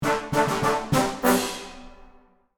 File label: Fanfare you lose fail game fanfares; misc musical